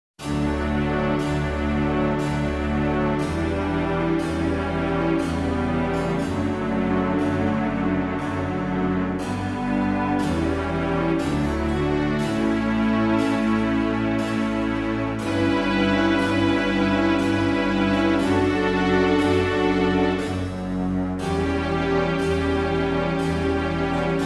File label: ombra mai fu strings d-dur low; 声楽用伴奏; lied